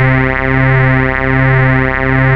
Ultimate Bass Root C; Vengeance Samples

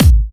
Kicks; Vengeance Samples

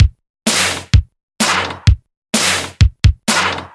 Housebeats, 128BPM; Vengeance Samples; VEH2 Loops

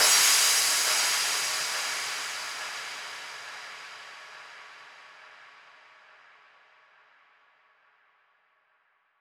138BPM Crash, Eminor; Drums & Perc; Vengeance Samples; Freefall Kit

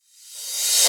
Reverse Cymbal; Vengeance Samples; VEH1 Cymbals

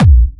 Bassdrums UK Trance Kicks

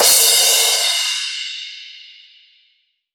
Crash, Cymbals; Vengeance Samples

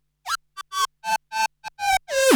Roland D Line, Roland S100

